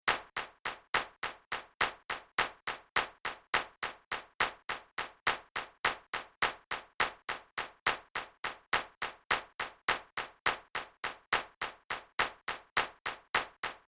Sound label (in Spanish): Flamenco Guajira 70